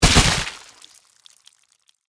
Impact Body Fall, Horror, Impact, Body, Fall, Splat; Blood and Guts; Blast Wave